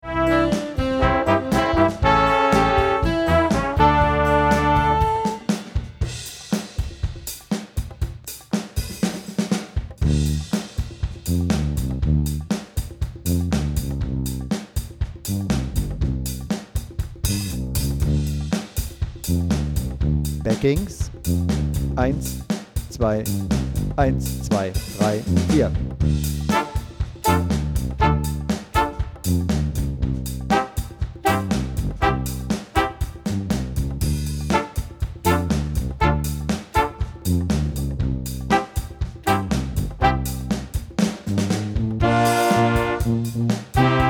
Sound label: Solo